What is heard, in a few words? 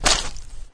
walk, flood